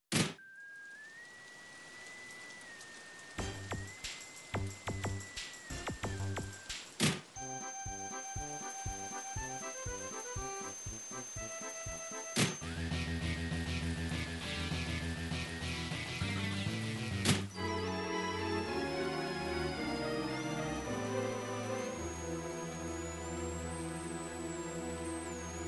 jazz, midi

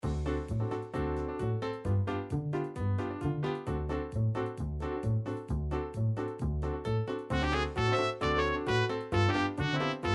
jazz meets klezmer, midi

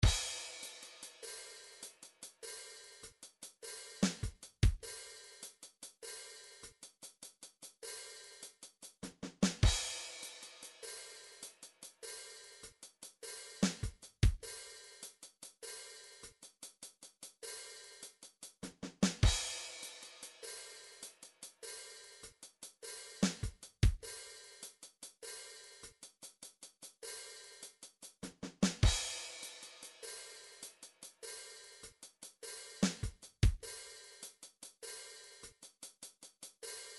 midi drums swing

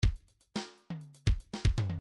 midi grooves funk

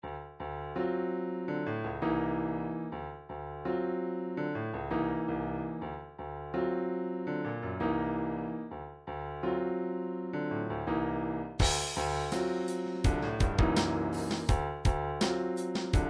triphop; Newtronic Triphop Construction Kit

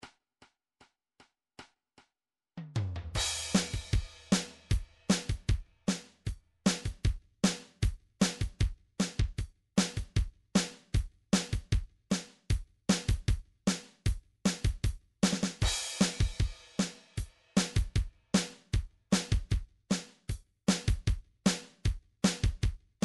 drum trax rb 154

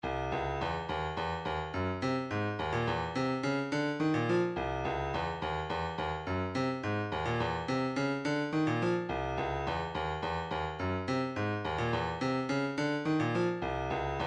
106 hihat

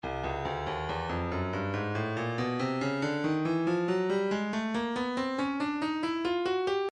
percussion midi files hi hats 070 hi hat; Stylus

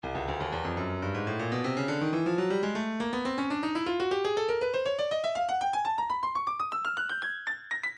percussion midi files congas 121 congas bongos; Stylus

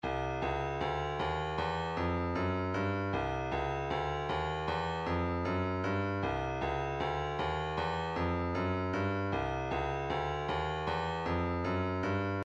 kick

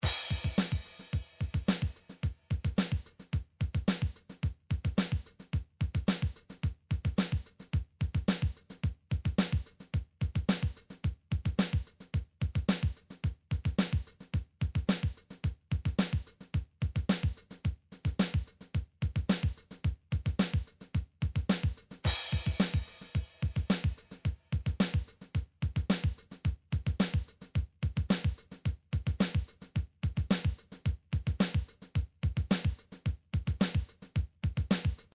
midi drums

